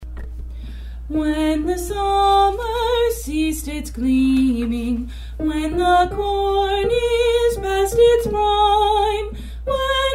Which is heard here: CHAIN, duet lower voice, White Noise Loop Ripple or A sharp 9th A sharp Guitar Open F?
duet lower voice